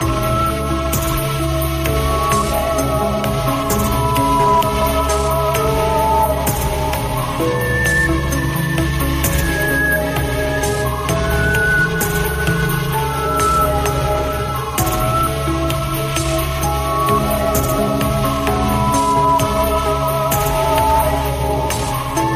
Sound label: Distant Space